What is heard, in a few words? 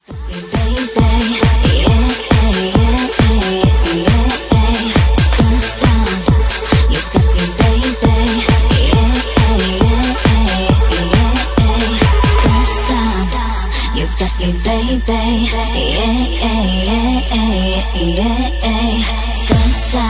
Bassline House Remix